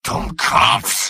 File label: medic negative vocalization; mvm